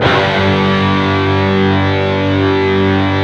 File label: PRS G#2; Guitars